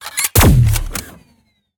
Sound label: future weapons assault rifle shot single; sonniss gdc